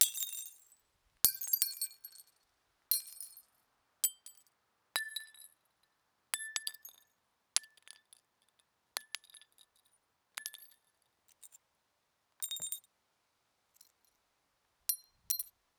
Lightbulb shatter; SFX; IRL Recorded

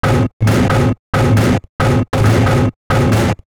Trash Table Beat; SFX; Experimental - Other

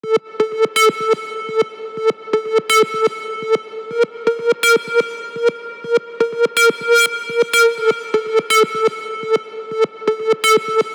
Melody Bounce 124BPM; Melodies

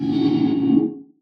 Underground Machine; SFX; Ambient - General

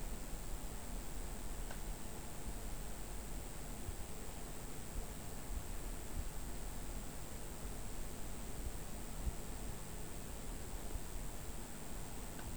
Fridge Buzzing; SFX; IRL Recorded

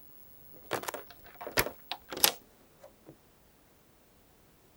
CRT Turn Off; SFX; IRL Recorded

